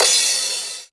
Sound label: drum hit finish